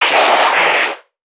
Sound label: NPC Creatures Vocalisations Infected; Five Nights At Akers; soma; npc construct